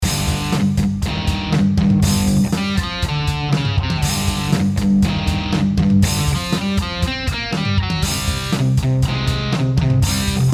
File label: dark blues